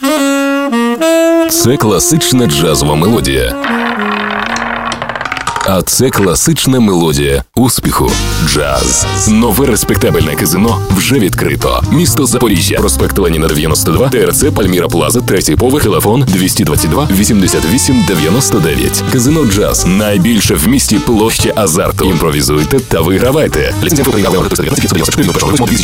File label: jazz